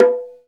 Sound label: BONGO, perc; Zero-G; Funky Elements